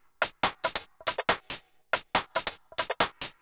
hifi, Techno Drums; TechnoMusicMaker

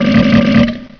Rude; SndSampler

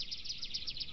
line cast; bassdemo

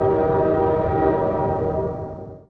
train hrn

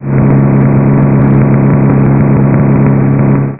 tank move

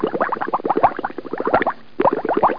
WATER; wingames; senet

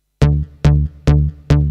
Basz; Techno Music Maker; Bass

